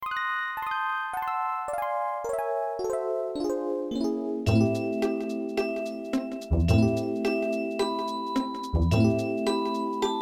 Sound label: swamp; pokerogue